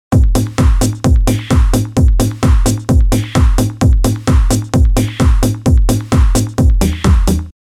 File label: Dance Loop